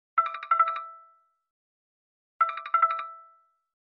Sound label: poco x3 notification poco x3 pro sms poco sms